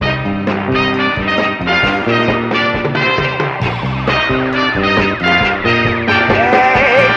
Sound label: horns, phonky loops